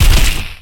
Melee Magical Heavy; Kilta

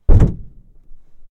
roof body impacts; Blind Drive

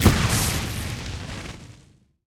Lightning Tank Footstep; AudioWizards

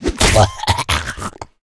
zombie whit; DeadFright

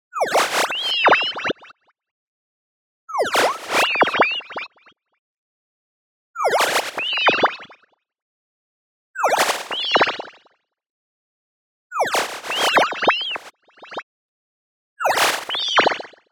tonal, reverser snappy sine; boom library; Anime Essentials

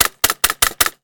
Staple Gun 5x; Tools; 1001 Sound Effects

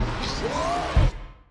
Punch Right Speed Up

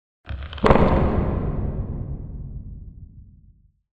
Impact Echo Metallic Hit - Low, Reverberant; Hollywood Edge; sound designer's toolkit v2

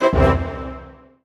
PUZZLE Success Brass Stab Decline Wet; stereo; Universal Sound FX

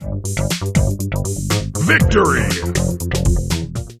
win Course; Space Wave Race

